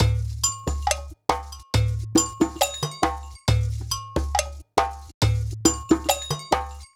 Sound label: Percussion, Cinematic Funk Urban; Sonic Foundry (Sony Creative Software); Cinematic Funk Urban Tone Poems